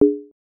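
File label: dialog warning, Ubuntu System Sounds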